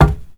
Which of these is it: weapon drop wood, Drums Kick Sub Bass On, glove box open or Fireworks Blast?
weapon drop wood